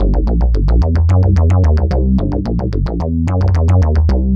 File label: Synth Bass; Sonic Foundry (Sony Creative Software); Electrocution